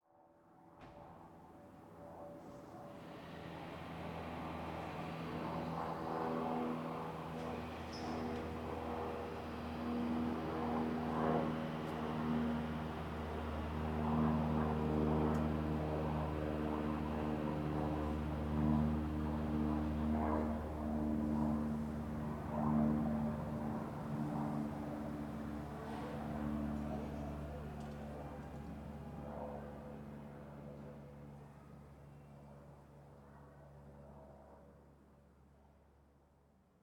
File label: Small Propeller Plane